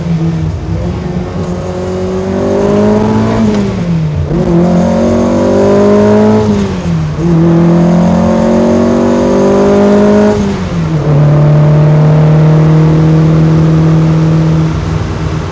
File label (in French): 1600S 1796cc 6500t par mn; diff 9x34; boite courte; pneu 165x13; environ 6500t par mn